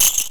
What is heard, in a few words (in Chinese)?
xiazhu add gold; slotsfruitex